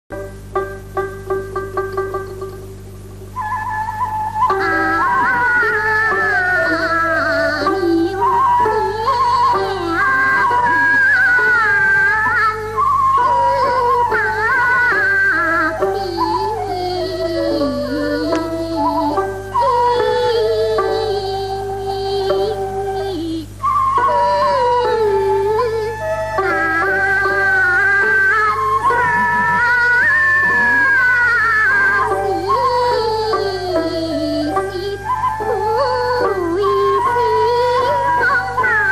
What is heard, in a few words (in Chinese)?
qing chang; 南音; 清唱《阿娘听娴》片断